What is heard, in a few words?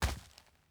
dirt land